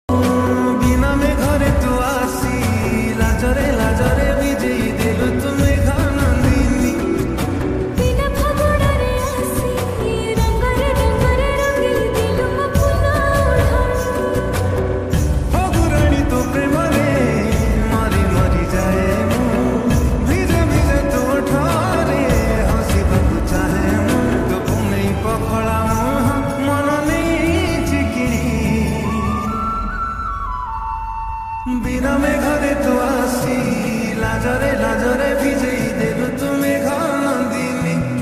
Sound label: New Odia Song Ringtone